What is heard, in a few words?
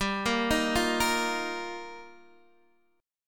G Minor 7th Sharp 5th, Guitar, Open D